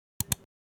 Mouse Click Sound

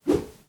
Swoosh

